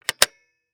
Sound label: Industry Switch Metal Switch Snapping Closed And Open Slow